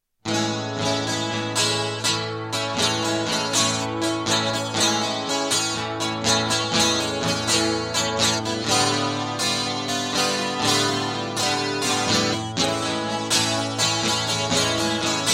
Rollin Guitar Loop

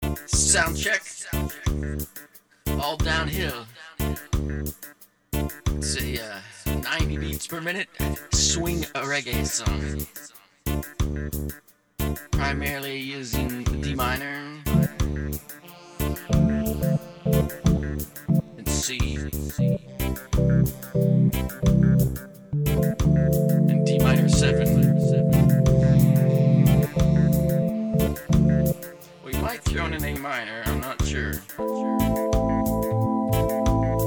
Soundcheck

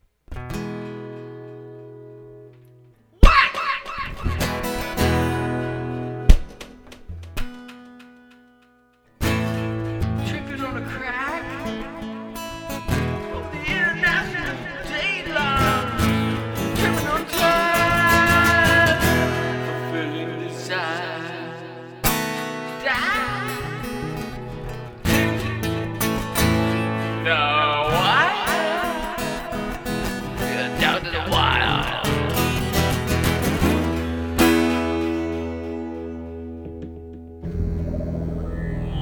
Instrumental

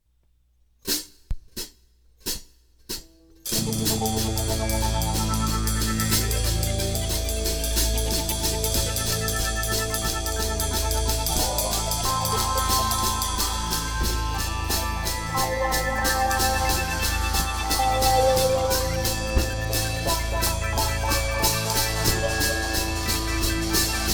Instrumental; hyper terrestrial

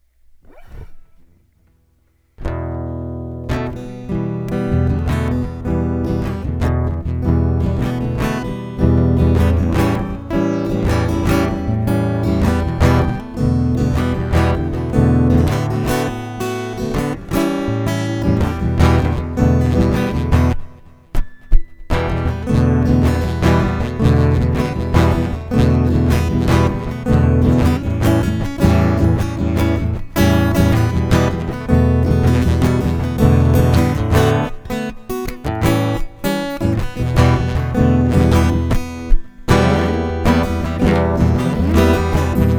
Instrumental

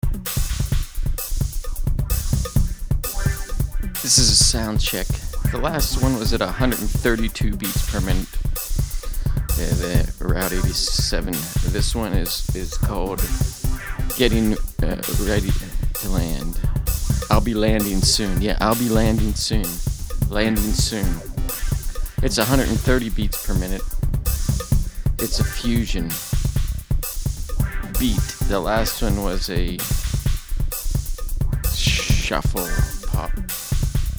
Soundcheck; Chill While Possible; Landing Soon

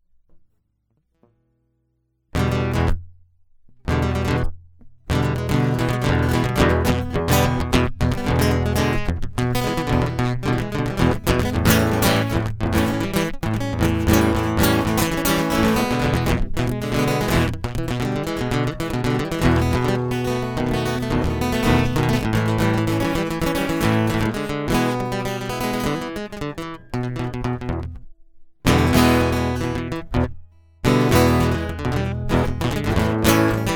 Guitar Loop

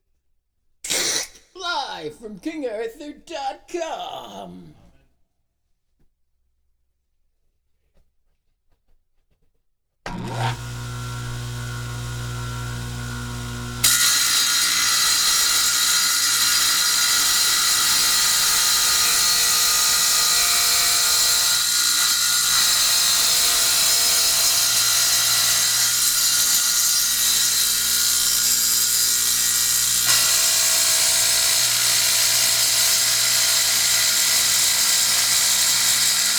wet saw

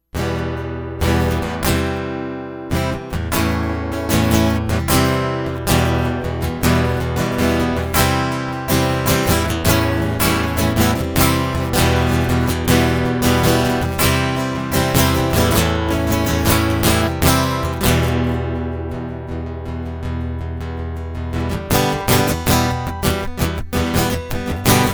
Prelude

